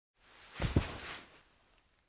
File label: Soft Cloth Bump